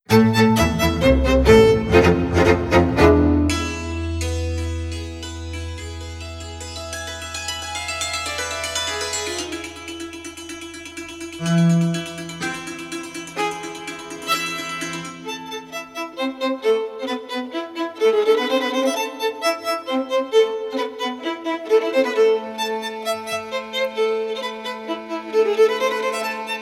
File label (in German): A-Moll Schottisch